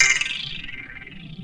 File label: VOCODER DRUM; Rubber Chicken Software Sample Bank; DRUMS + PERC